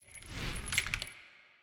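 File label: symbol unlock; druids dream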